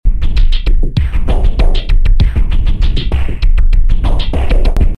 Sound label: Slammin' Electrofunk 98